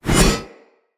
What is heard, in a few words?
Curved Sword Whoosh Heavy Left Right; Weapons; Player; Swords; Sinecure